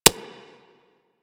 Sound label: Infested Minion Step Default; Warframe